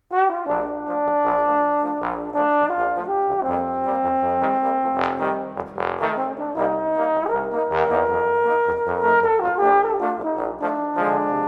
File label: Trombone